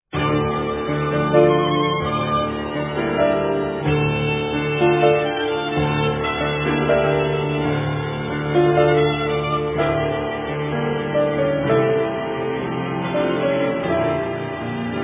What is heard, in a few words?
Trio d-moll quasi una ballata; Vitezslav Novak; op 27